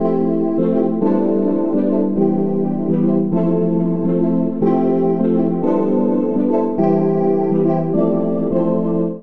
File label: Subtle Lightwaves C Major 104 bpm; Accompaniment; Tonal; MIDI Clips; Drive and Glow; Ableton; Factory Packs